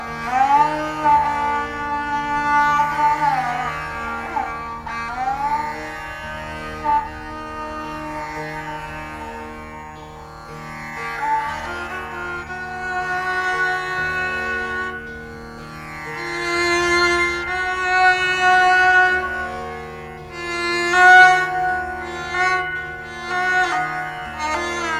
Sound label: Raag Peeloo, sarangi; sarangi recital